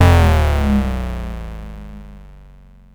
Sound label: Perc, Nord Modular Drum Samples; Yawgmoth